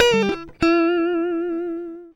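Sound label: E lick guitar; E-MU Producer Series Vol. 8 – Platinum Phatt; Gtr Chords&Licks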